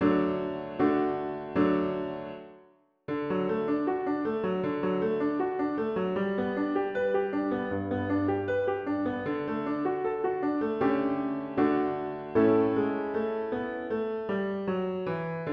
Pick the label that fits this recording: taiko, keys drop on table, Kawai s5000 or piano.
piano